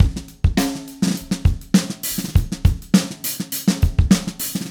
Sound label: Acoustic Kit, Drums & Percussion; Electronic Point Blank